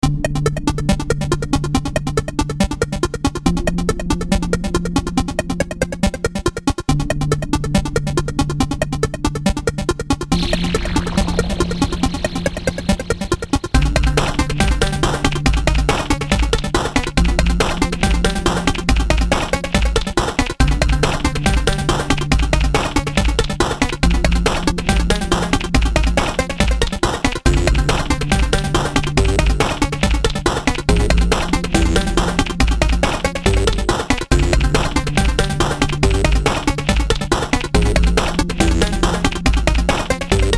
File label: Games and 8 Bit Kit; ATOM SPLITTER AUDIO